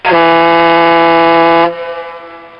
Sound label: boat horn; Mafie 1